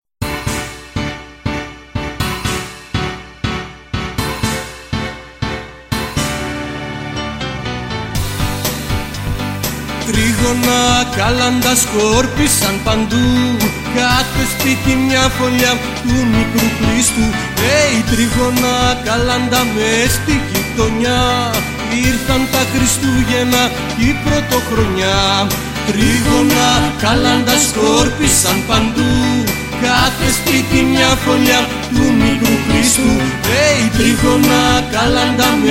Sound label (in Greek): Τρίγωνα κάλαντα